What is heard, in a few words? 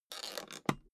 suitcase close latch lock